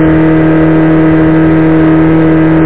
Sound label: ENGINE; WRECKAGE